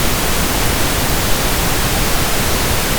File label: pink noise sound effect; Generated pink noise sound effect 3 seconds duration for audio testing